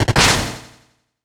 Combat Triple Combo Hit, 8 and 16-bit Video Game SFX; Impact Soundworks; Super FX